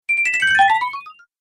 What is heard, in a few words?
Magic Health Boost, video game